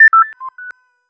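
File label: sound of multiple notifications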